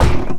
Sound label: heavy wood, footsteps; player; heavyfeet; Dystopia